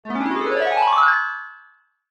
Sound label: cartoon rise climb ascend magical; zapsplat